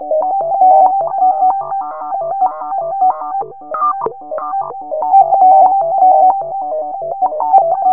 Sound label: ARPS, ARPS ATMOS; Zero-G Ambient 1